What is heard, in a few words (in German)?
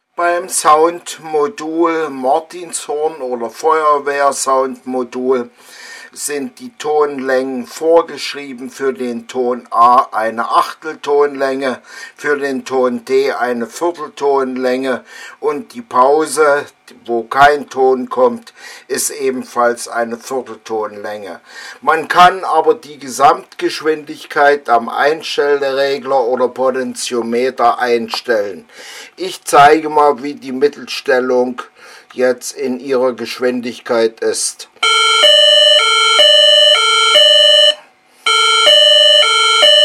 Geschwindigkeit Feuerwehrsignal